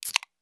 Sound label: item soda can pickup